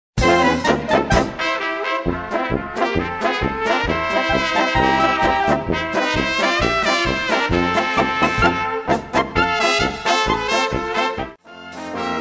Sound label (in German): Polka